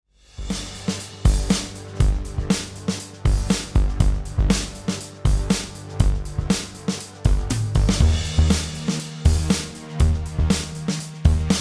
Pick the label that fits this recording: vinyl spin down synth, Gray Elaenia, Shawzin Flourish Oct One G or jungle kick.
vinyl spin down synth